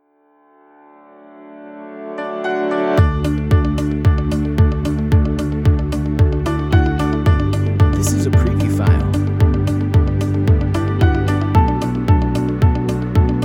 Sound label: Sweet Corporate